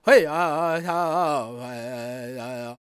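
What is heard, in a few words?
E minor, World Voices, Lakota; Spectrasonics Vocal Planet CD5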